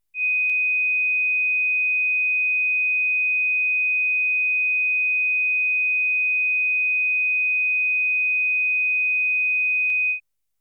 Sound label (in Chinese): 2.5KHz 測試訊號; 雨果金碟